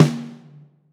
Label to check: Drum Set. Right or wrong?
Right